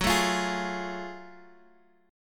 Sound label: F sharp Minor Major 9th, Guitar Drop D